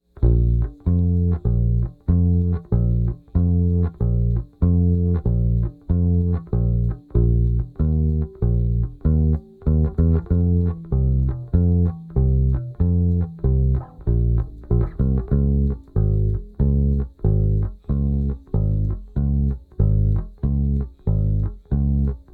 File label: rhythm